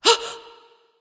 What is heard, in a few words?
surprise; smite; arachne